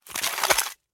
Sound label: Icicle Fall Stinger